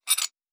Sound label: Metallic Gear